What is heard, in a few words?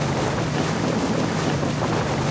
Auto Tires Water Loop; Automobiles